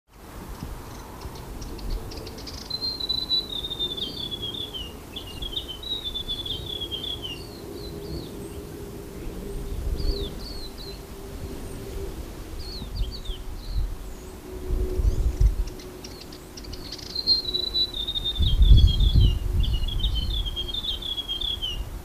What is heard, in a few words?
hill grey warbler